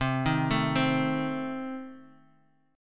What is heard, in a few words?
C Minor 6th, chord